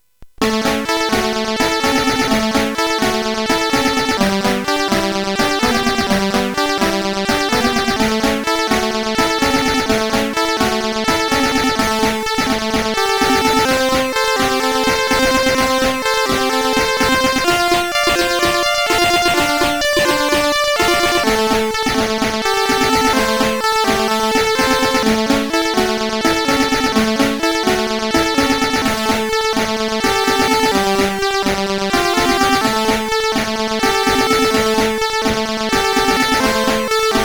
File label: Little Song, CSG8580R5; Little Song 6; hvsc